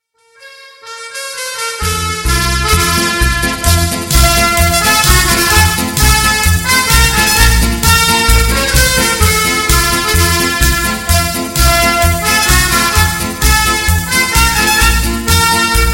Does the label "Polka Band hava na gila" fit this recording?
Yes